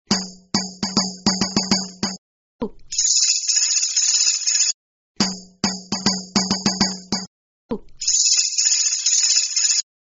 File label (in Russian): buben